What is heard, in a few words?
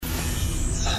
hologram fade in